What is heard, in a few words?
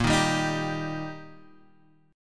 G Minor Sharp 5th, A sharp, guitar chord